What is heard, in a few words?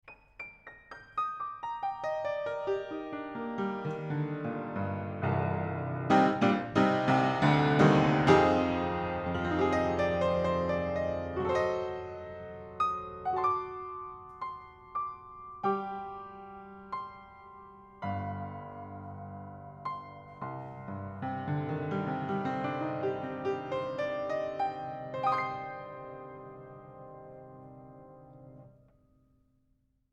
Piano, Panels Off 50cm Spaced Omnis 80cm Soundboard